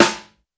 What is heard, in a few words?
Rock snare; Reference Beat